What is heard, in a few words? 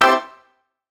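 organ stab (C)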